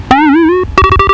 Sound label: bell